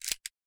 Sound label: hg slide